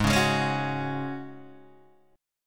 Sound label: G sharp 7th Suspended 4th G sharp, Guitar DADGAD